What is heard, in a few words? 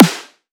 Layered Wet Snare